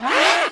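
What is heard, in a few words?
DEATH; giants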